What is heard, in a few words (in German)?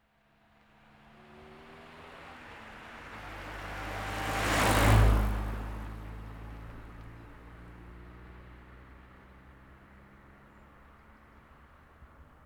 Auto von rechts